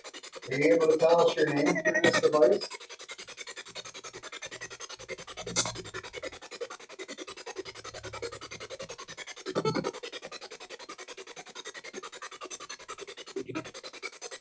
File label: Janitor Room Basement Spirit Box; poasttown elementary school investigation